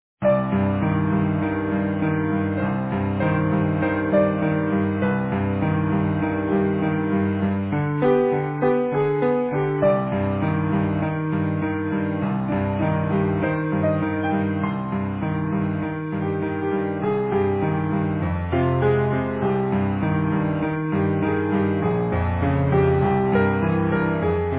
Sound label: Easy Piano